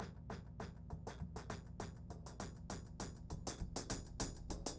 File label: Remixed Drums 100BPM; The Roots Drumloops